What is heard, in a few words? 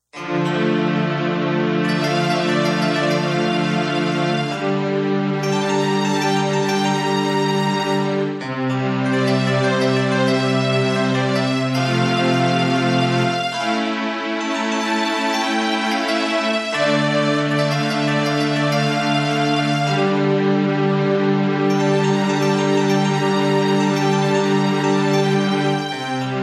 instrumental